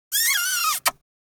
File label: Little girl kiss